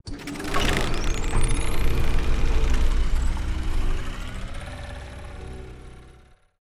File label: Portal Motor Start; Game rip; MiSide